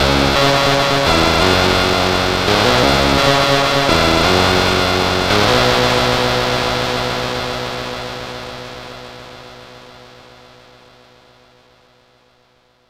extremely fast cf lfo, modsynth

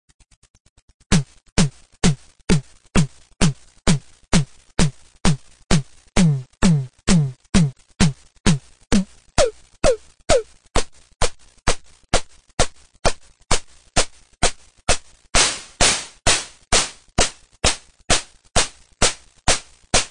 sn tom, synsonic

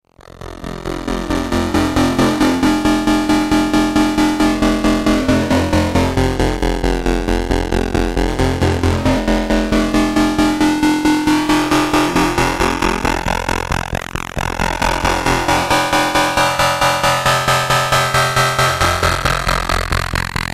pulse symmetry sweep

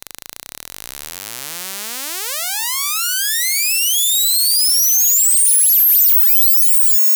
pulse sweep

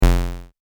bass pulse in; tellun

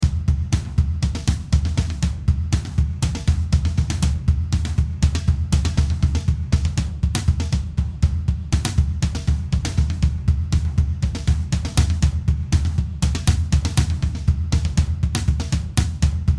drum test

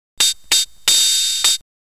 dr110

